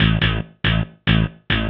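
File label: SYNTH BASS DANCE 140 A; eJay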